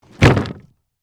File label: Impact Wood Plywood, Drop, On, Plywood; Materials